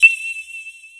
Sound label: map pin; GrandFantasia